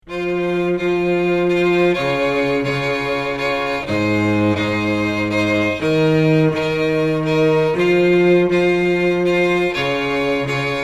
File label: string quartet